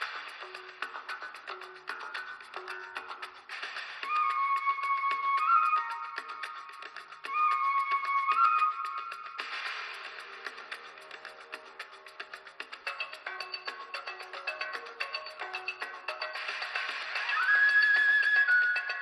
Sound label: BackStab; Gangstar Vegas; Android